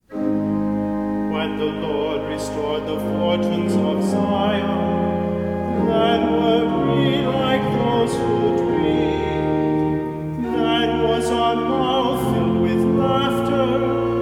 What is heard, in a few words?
Psalm Ang Chant